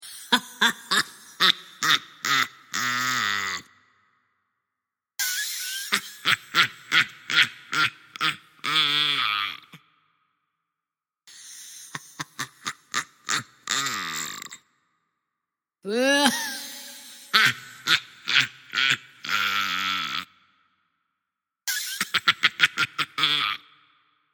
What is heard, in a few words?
Old Hag, An evil witch laughing; Evil Laughs; Horror